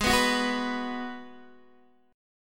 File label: G sharp Minor 7th G sharp, Guitar Open D